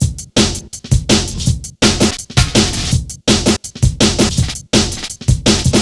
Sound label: 165, Drum Loops, Drum & Bass; Total Drum&Bass